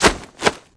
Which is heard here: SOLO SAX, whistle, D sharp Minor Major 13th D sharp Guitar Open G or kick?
whistle